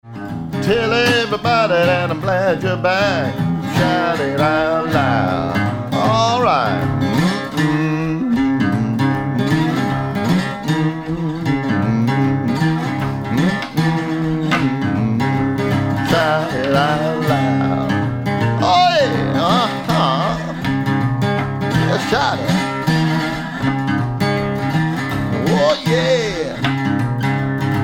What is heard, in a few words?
stripped down